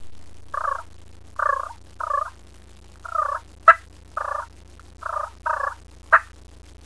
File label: purr